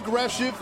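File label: aggressive sniff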